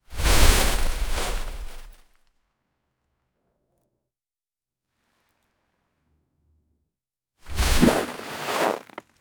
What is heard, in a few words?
a soft airy whoosh, snow